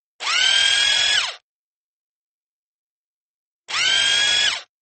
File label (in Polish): wiertara; shockwave; fixitupkatesadventure